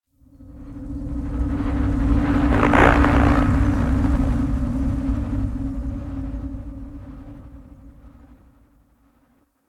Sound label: Cave Head Display Static; Ambience; Warframe; Stalker Cave